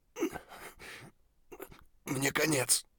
hurt near death; Escape from Tarkov

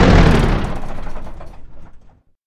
Vehicle Engines; Red Orchestra Ostfront 41-45

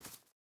footstep barefoot grass jump start; Rust

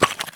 run tile; Escape from Tarkov

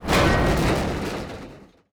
objects, World of Tanks

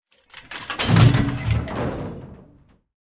cannon 380mm stumm mech, cannons tanks; War Thunder

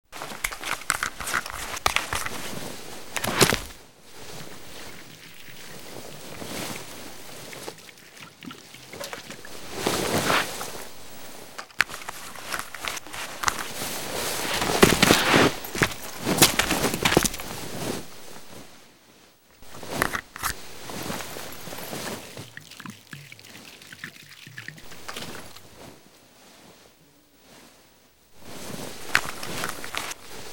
bball resting guy; trainer; Call of Duty Modern Warfare

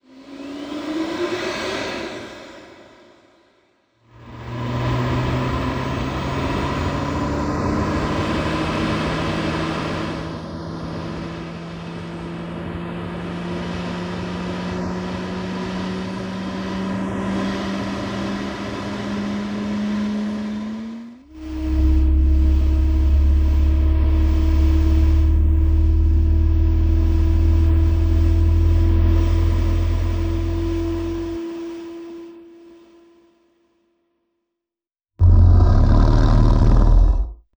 Aircrafts Su-27 Cockpit APU In; DCS

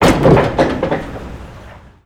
uaz ramp rear; Modern Warfare

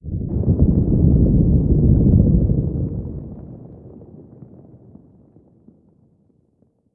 earthquake rumble; Call of Duty Modern Warfare

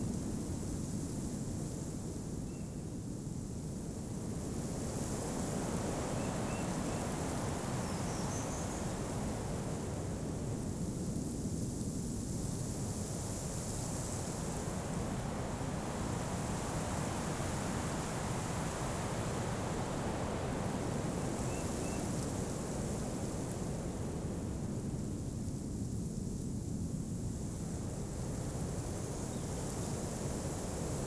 ambience field; Rust

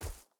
wolf footstep grass hard; Rust

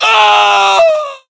death fall; english; voiceovers; falls

